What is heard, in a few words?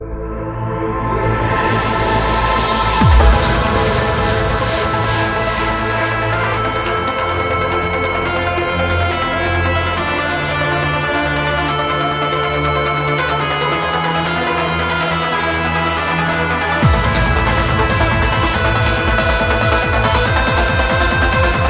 Trance